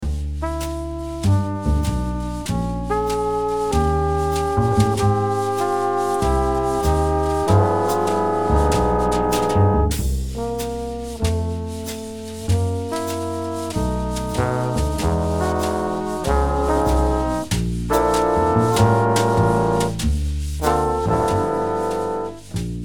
solo background; accompaniment for solo excerpt; This becomes our Minus You solo accompaniment track.

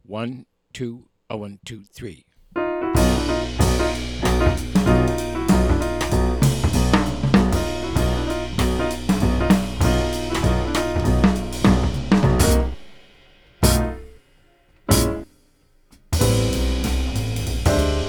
minus melody performance

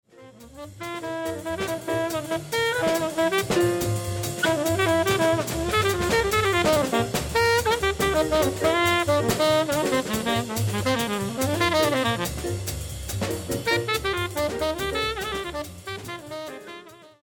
solo

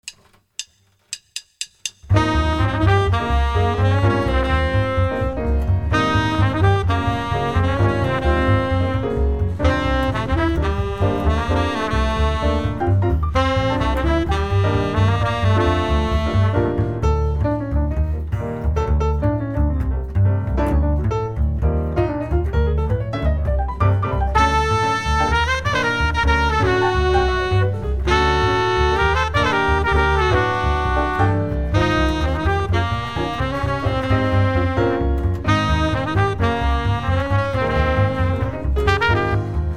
minus Drums

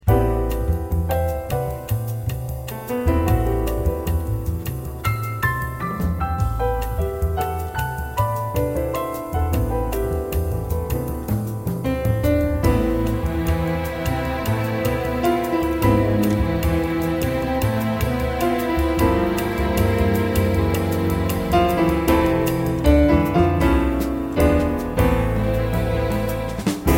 passionata strings

